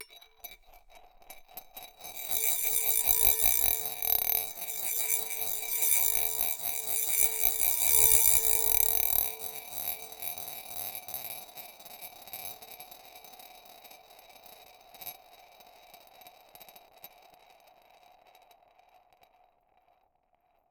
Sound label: Marble Spin, Large Wine Glass